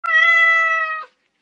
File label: meowww cat sound